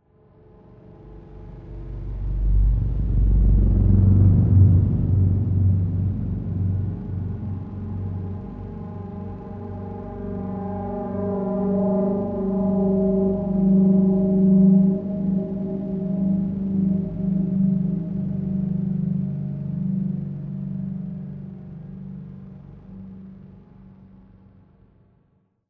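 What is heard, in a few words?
Subtle Dark Horror Tension Swells; Arc Raiders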